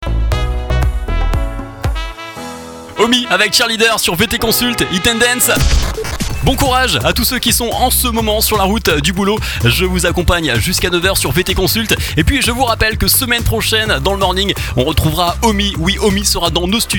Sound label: RNB